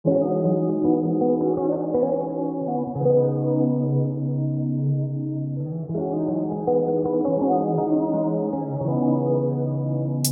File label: Mellow pop